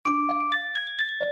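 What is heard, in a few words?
Music Box Notification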